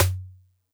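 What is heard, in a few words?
Xylo Snare DX100 F sharp 4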